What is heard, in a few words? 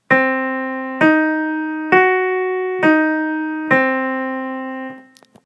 diminished triad